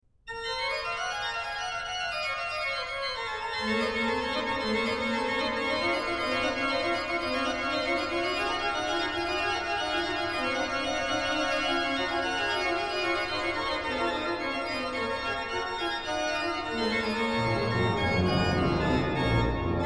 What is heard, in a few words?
Praeludium con Fuga in a, ORGAN; BWV0551; BACH; BACH ORGAN WORKS